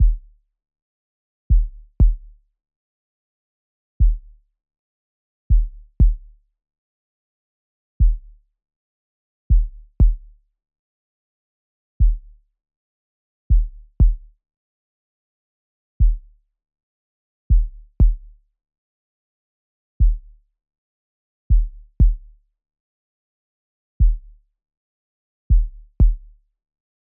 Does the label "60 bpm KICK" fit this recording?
Yes